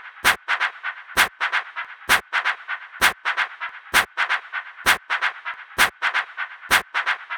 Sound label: Drum Kit Foley 130, Techno Drums; Drum Kits; Stems; Ghosthack; Drum Kit 001